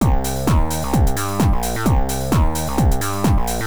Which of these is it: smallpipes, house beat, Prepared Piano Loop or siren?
house beat